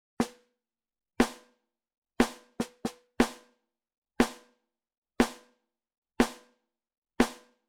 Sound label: Wave Drum SEP SD